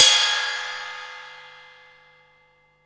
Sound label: hat, Techno 138 BPM